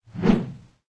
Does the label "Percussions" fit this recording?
No